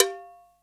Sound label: LOW COWBELL; Best Service Advanced Media Trax Modern Composer; LATIN PC